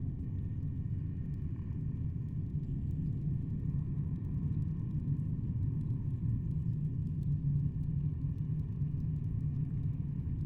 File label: amb feast spooky spooky